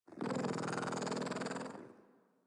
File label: Kitten Growly Purr; Enemies; Warframe; Catbrow